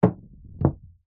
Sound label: sport snooker pool junior hit ball into table wall; zapsplat